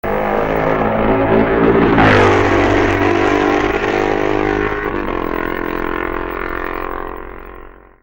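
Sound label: Norton, motorcycle; 1967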